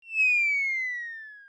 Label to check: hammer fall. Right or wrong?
Right